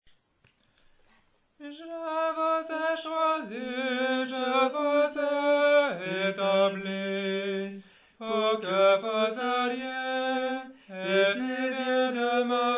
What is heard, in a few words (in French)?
Voix de ténor